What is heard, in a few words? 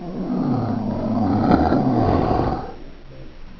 bullchicken attack growl; xash3d fwgs